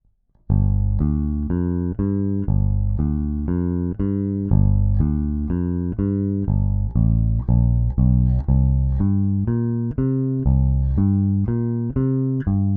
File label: AER AMP One Two Three Treble cut